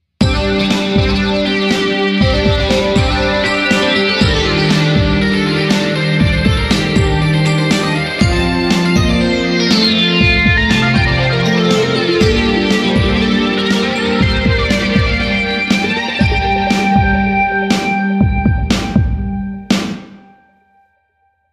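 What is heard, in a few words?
chorus guitar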